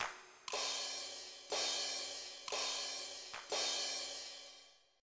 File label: JAZZ; TG500